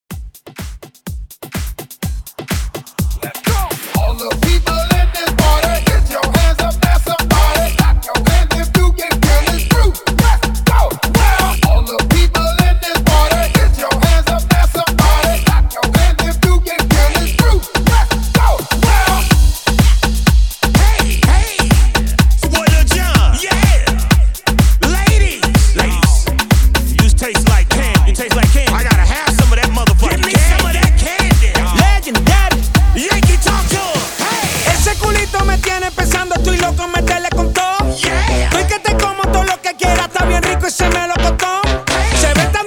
HYPE REDRUM 125 BPM

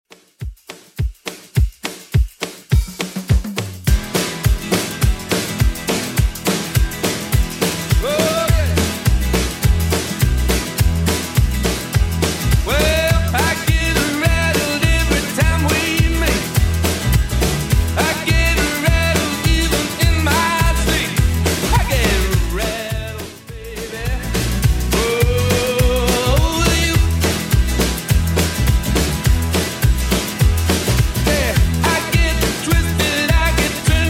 80s Redrum 104 bpm

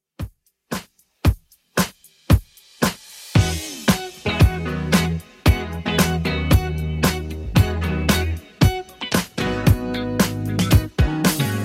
70s Redrum 114bpm

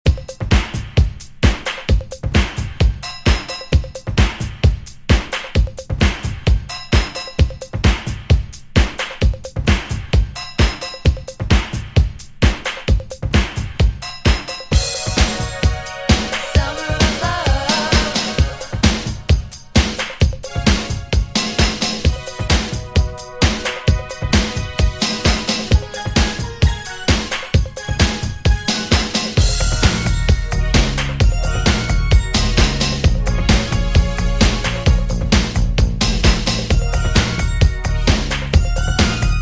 Funk 80s Redrum 131Bpm

